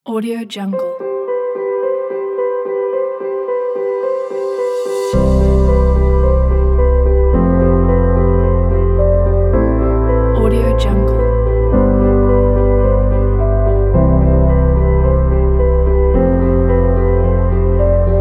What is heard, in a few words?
positive cinematic documentary